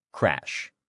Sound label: crash